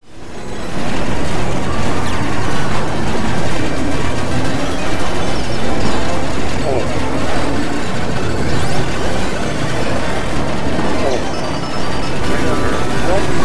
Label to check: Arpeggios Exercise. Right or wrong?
Wrong